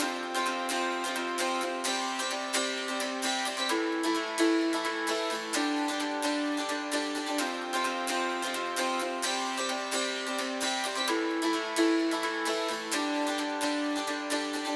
アコギ

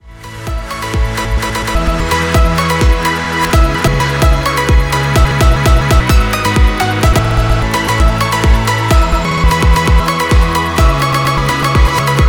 シャッフラー

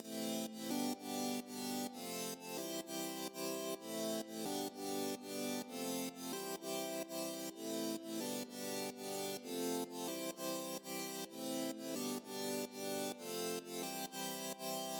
サイドチェイン; edm-instruments

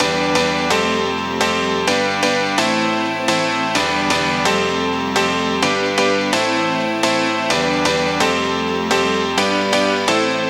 ピアノ; edm-piano

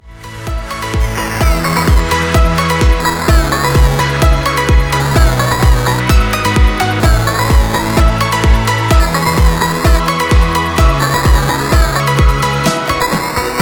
ローファイ

